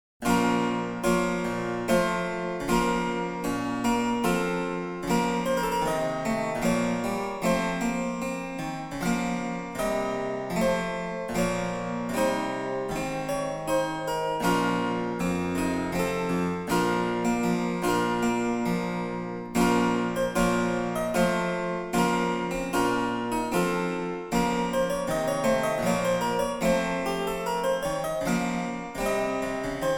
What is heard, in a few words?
German Harpsichord Front 8; 1741; Excerpt from The Galliarde to the Firste Pavian by William; edition organeum